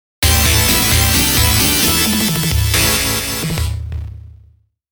OVER (GAME OVER); Irem Retro Game Music Collection

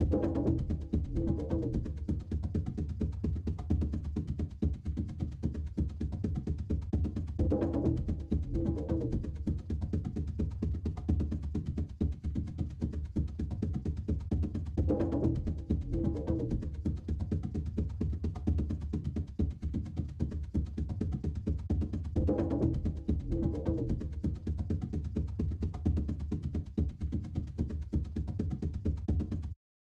Mus Action Perc Low 130; moons of madness

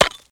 Ice Light Collision; angry birds rio

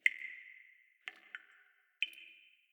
water drip interior

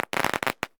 Fireworks; virtual city windows gamerip 2009

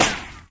Bullet impact

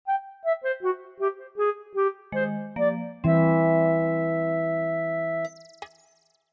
Fly Swatter (Game Over); WarioWare Inc.

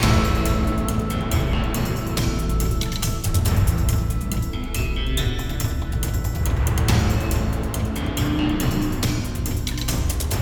Combat; Lynchwood Sheriff

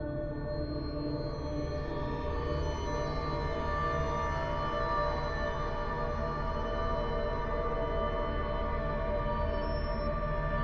Meadow Transition Music; gamerip; Hellblade Senua's Sacrifice

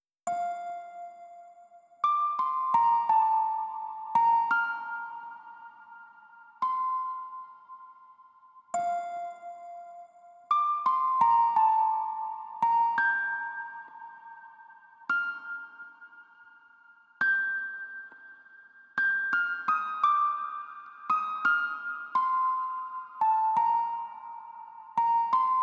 Lullaby

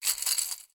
money down

